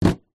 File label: foley water cannister plastic 10 ltr set down on concrete ground; zapsplat